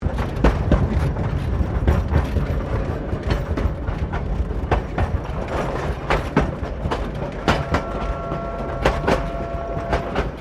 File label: Train Carriages 1937 Exterior Rolling Loop; Bellarine Tourist railway vehicles; Trains